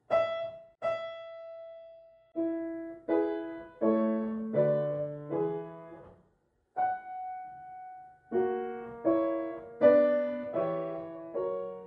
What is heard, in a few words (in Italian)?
Andante con SOL bequadro; La vendetta di Venere nr 24